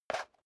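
step leather shoes concrete slide short, footsteps; schritte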